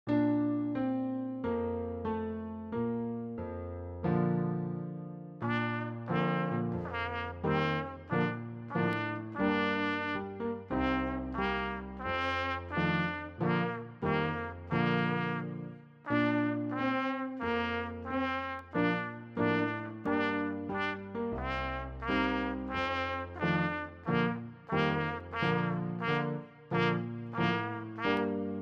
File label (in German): Lieder Trompete; Bauer Max